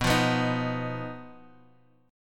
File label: B Major Flat 5th, chord; strummed; 7,8,9,8,x,x; JGuitar